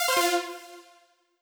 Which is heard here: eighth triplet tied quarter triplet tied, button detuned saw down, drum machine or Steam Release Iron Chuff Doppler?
button detuned saw down